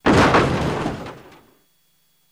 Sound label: Jeep Land After Jump, hits & rattles